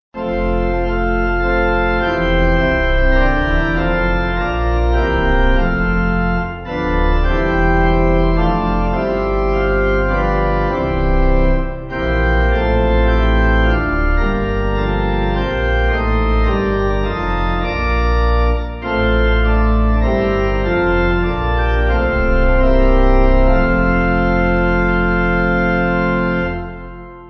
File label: Pipe Organ, Music Backing Files for Churches